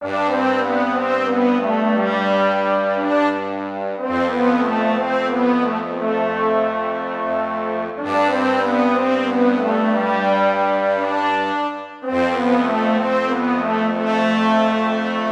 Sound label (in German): Fanfare; aschenputtel